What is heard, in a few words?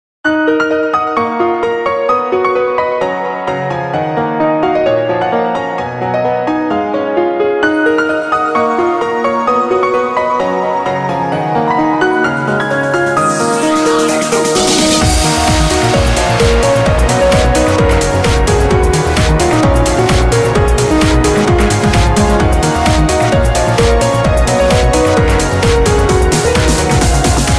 vocaloid